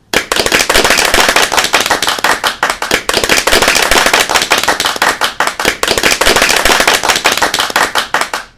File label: clapping